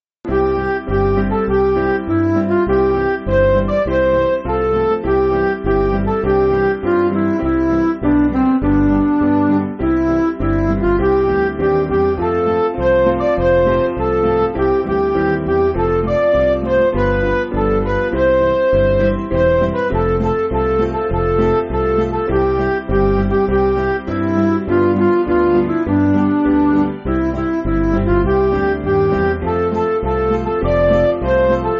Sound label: Midi